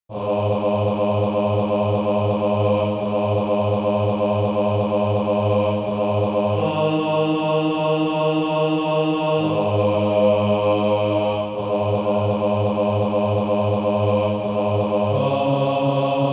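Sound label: bass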